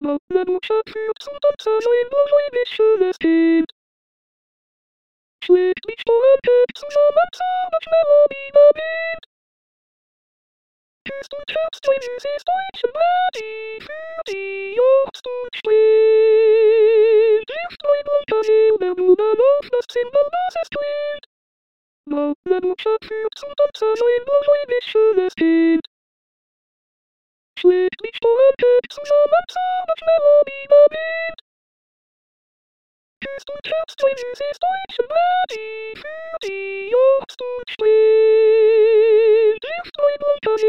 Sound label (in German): Zigeunerlieder soprano